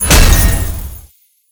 guard break; black silence

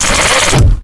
charge hook; freak fortress 2; robo shitcake

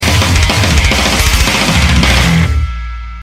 outtro music; not true admin

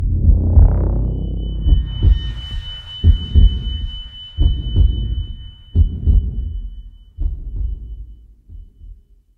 ambient

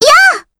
hit

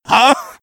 jump; freak fortress 2; some clean trash

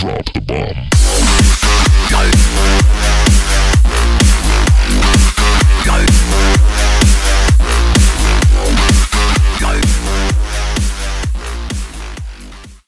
outtro music; freak fortress 2; deadshot sniper v2

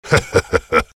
laughing; night wanderer v2

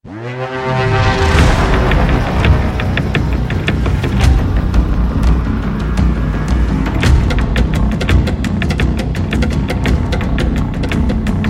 snow bgm; commandoscout

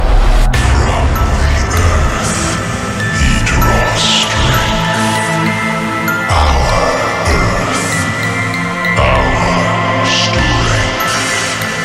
intro music; victria; hercules

